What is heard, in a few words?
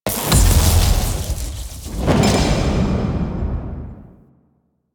Cephalon Puzzle Action; Warframe; Infested Eris; Cephalon Crypt Puzzle